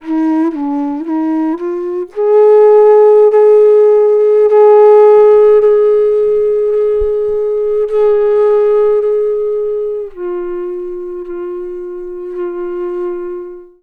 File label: FLUTE D, Indian Traditions